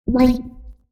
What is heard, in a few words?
micro on